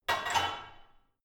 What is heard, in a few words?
barbell only replace unsteady; sport freizeit; fitnessstudio